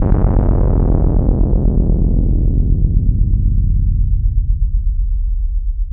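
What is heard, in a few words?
4 Pole Filter sweep; Synapse Audio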